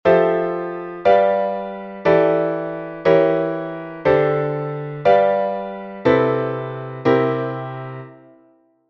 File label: chord